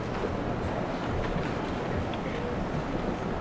ambi; Mafie 1

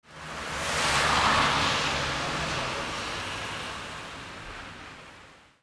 car; Mafie 1

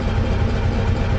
cord; Mafie 1

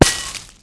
bush; Mafie 1

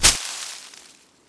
bush; Mafie 1

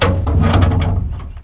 door op; Mafie 1

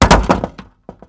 wood; Mafie 1

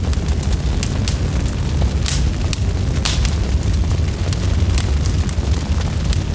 fire; Mafie 1

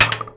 metal; Mafie 1

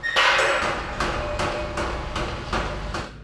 build; Mafie 1

